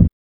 BD

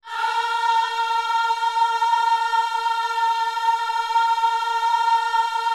OHS A#4, gospel; Spectrasonics; Vocal Planet Vol.1 Gospel; akai

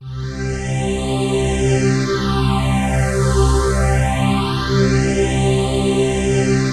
PHASE PAD, ATMO PADS; Best Service; XX Large

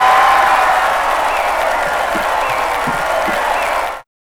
CROWD

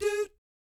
"doot", Jazz Fx, G#4; Spectrasonics; Vocal Planet Vol.3 Jazz Fx

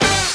stab; ZERO-G; Datafile

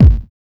BD; E-Lab; X Static Goldmine 1

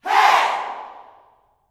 HEY, Vocal Planet Gospel YELL SHOUT; Spectrasonics; Vocal Planet Vol.1 Gospel; akai

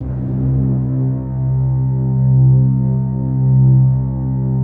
PAD; Best Service; XX Large; Pads

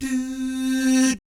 DOO SFZ, Vocal Jazz Fx

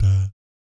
DAT G2, Vocal Planet Jazz Fx, JAZZ COMBO; Spectrasonics; Vocal Planet Vol.3 Jazz Fx

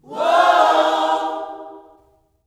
WHOA OHS, Gospel; Spectrasonics; Vocal Planet Vol.1 Gospel

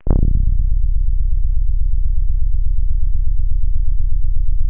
BASS; ZERO-G; Datafile

